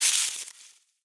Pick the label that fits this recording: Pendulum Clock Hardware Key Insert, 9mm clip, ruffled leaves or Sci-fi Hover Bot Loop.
ruffled leaves